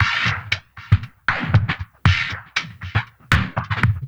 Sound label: SWEEP2 L; Spectrasonics; Liquid Grooves 1 & 2; 117 AQUAFUNK